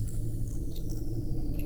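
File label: Music Dpad Evaporate 145; from dust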